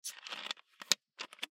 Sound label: Bottle Cap Open, Bottle Cap Open Twist Take Off; Lid Sound Effects; Lids (Sound Effects:Objects); Blastwave; Foley